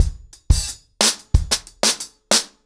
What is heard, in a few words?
ACOUSTIC DRUMS, 90 BPM